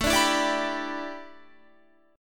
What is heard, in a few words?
A 7th Suspended 2nd Suspended 4th Guitar; A7sus2sus4 strummed